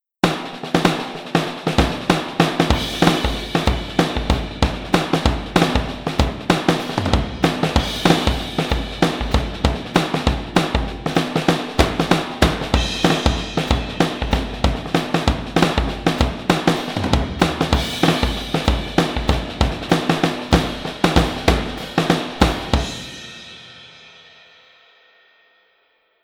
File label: Dirty Room Diddley; SUPERIOR 2; Avatar